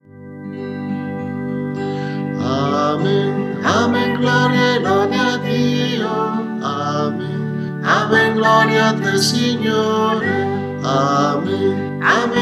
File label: Amen